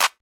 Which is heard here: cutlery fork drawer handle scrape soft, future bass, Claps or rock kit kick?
Claps